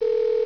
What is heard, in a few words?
alert sound; Adam4Adam